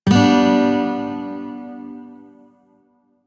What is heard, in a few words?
Shawzin Maj Chord H; Music; DE; Warframe